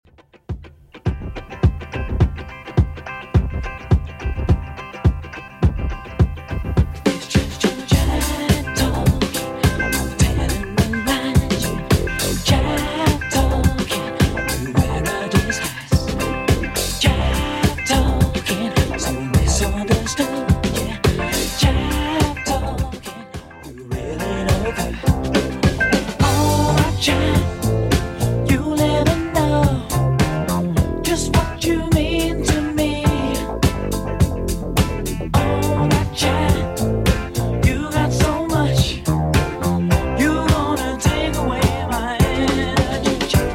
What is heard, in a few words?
70s Xtend Redrum 105bpm